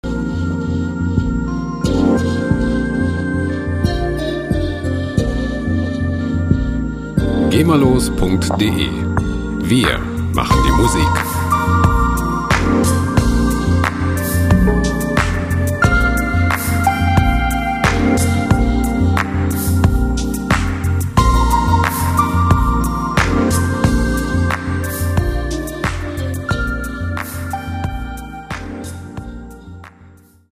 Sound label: organ